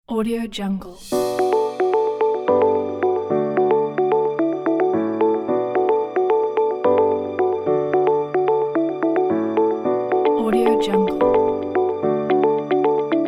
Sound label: uplifting motivational ambience